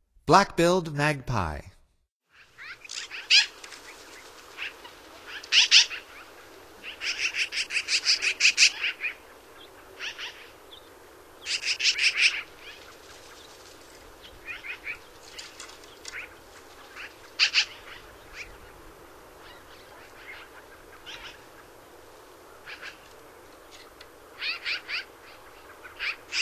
Black-billed Magpie, Songs of Birds of North America; Stokes